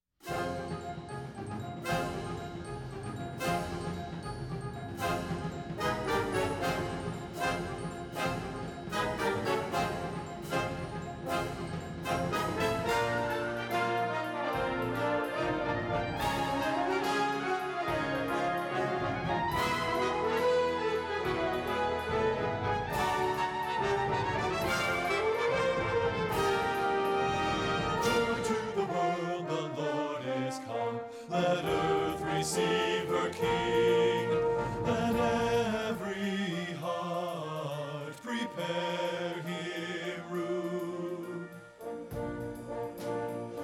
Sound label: BASS